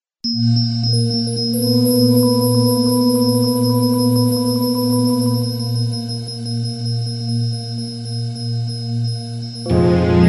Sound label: eerie; Dragon Ball Z Best of - Volume 4